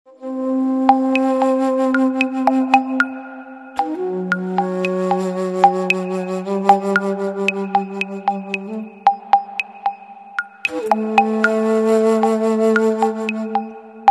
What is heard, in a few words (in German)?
regenfloete